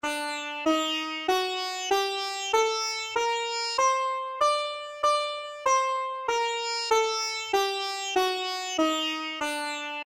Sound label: Phrygian Dominant Scale D Ahava Raba, Virtual Sitar; Syntheway